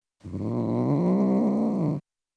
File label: Tom Cat Growling, Individual Grow; Cats; BBC Sound Effects Library